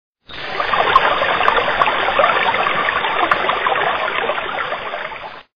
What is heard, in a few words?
River sound